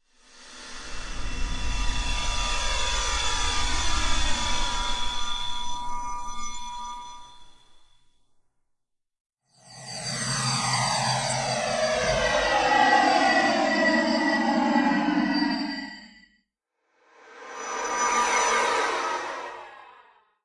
Various Shimmer Sounds

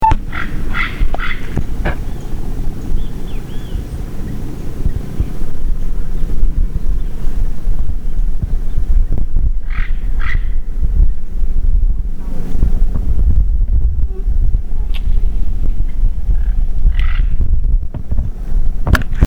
Red tailed amazon; پرنده آمازون دم قرمز

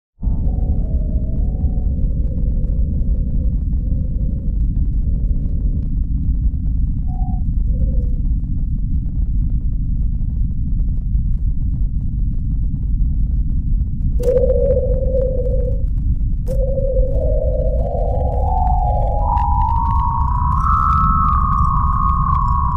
Venus; سیاره زهره

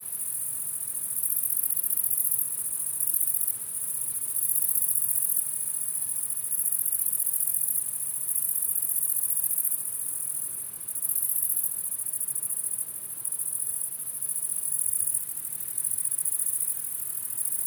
Grasshopper

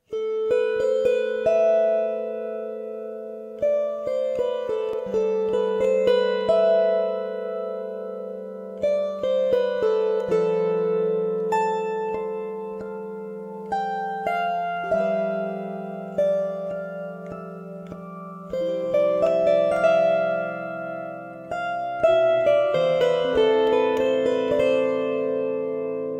Kobza; کوبزا

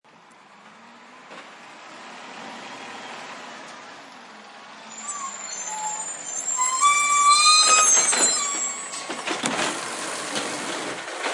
garbage truck

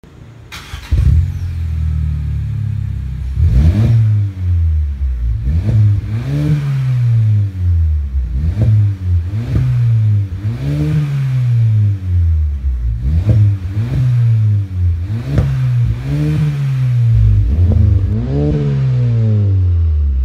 GAC EMPOW; اگزوز

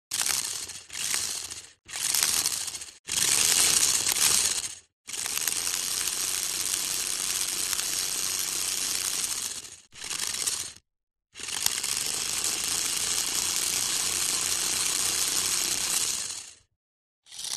remote control car; ماشین کنترلی

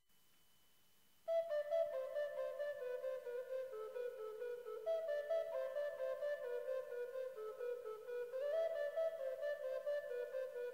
Shvi

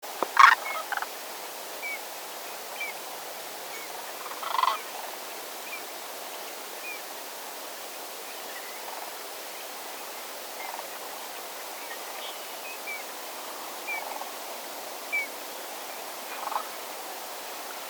Sandhill crane

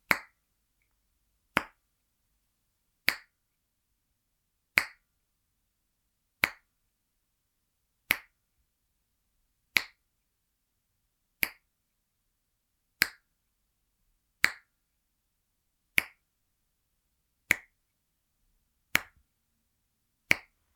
hand clicks finger clicks finger clicks

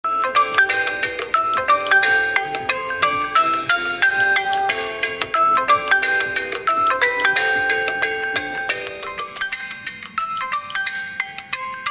Asus ringtone

